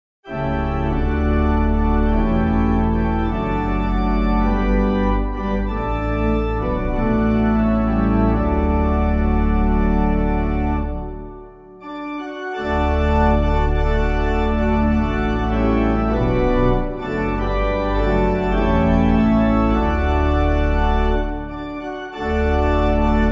organ

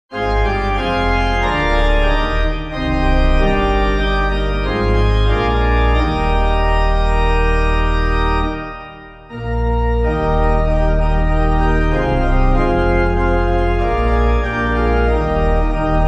organ, hymn; As the Birds of the Air; MIDDLEBURY; Clyde McLennan

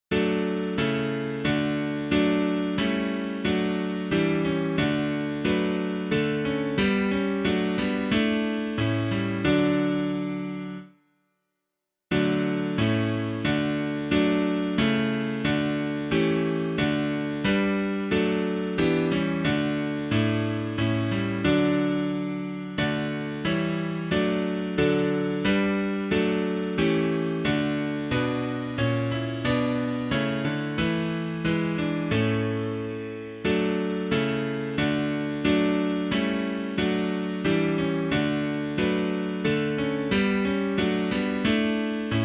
piano; For the Healing of the Nations; Kaan; TANTUM ERGO; OCP

